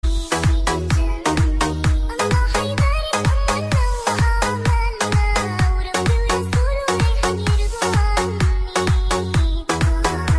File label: arabic popular ringtone